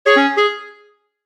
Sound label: new message